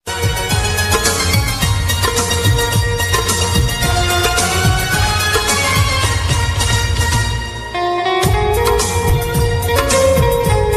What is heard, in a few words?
call ringtone, hindi ringtone, sad ringtone, love ringtone song